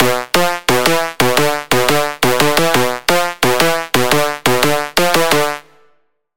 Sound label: Pluck; Routed Sound; Sylenth1 Expansion - Chaos Bringer Vol.1